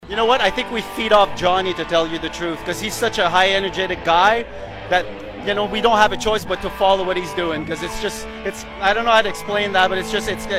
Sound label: guitar